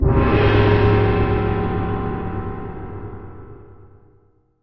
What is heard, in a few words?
gong; Freeciv